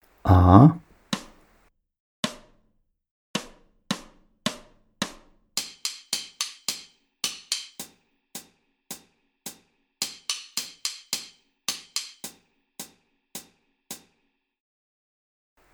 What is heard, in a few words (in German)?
rhythmus pattern ii